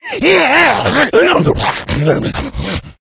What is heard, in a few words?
rage at victim, infected, male; npc; Left 4 Dead 2; Source Engine